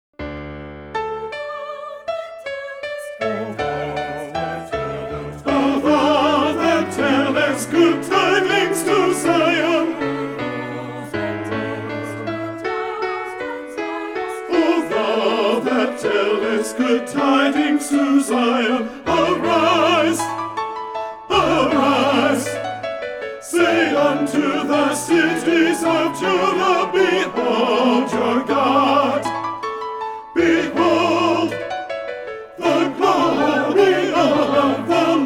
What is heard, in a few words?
o thou that tellest good tidings tenor; Messiah; Handel